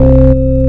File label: FM Harmonic, wavetables; Animoog